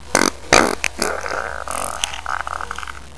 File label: fart; Dynamite